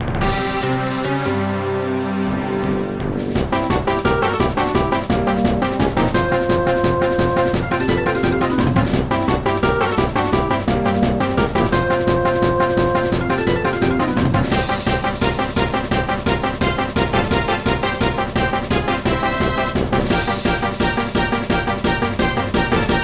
Boss Battle Theme; M&LPiT

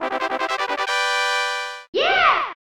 Puzzle Mode Fanfare

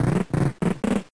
Door Open, Sound effect

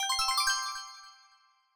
5-Up; SMBW; Badge Shop

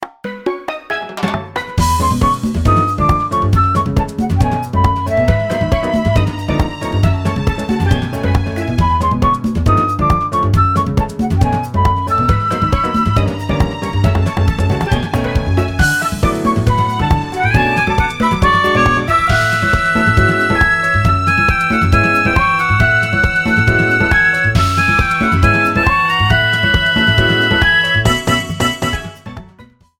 Gentle Sea Breeze

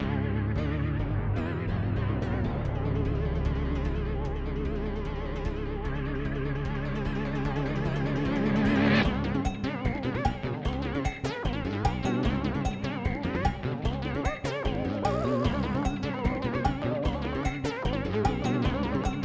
Battle Low Health; Ludwig

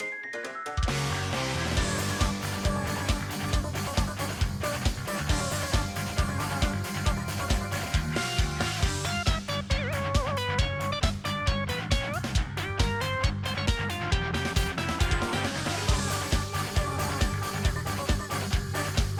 Event Battle

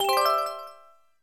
Coin Appear, Sound effect; SMG

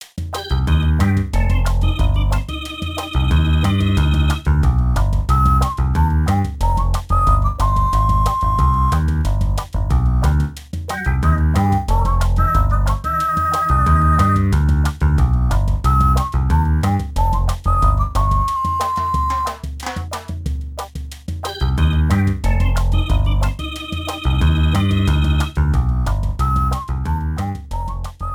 Music Trophy Theme (Battle, Lose); MKDS

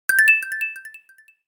Item Stock Got After; SM3DL

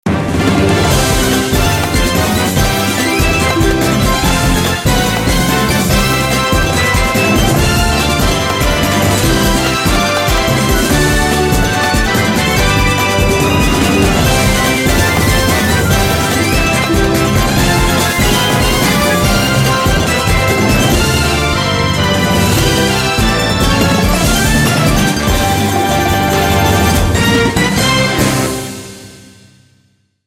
Party Music; Mario Party 6

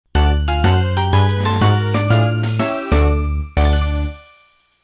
theme

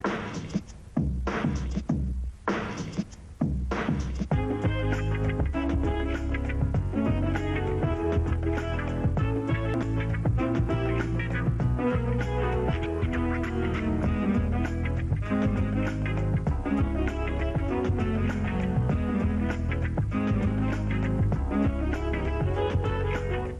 Song Instrumental

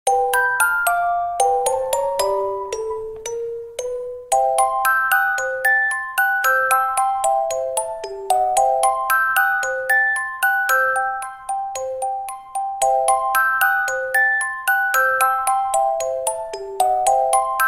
Underwater Night theme

